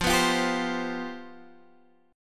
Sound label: C Major 7th Suspended 4th F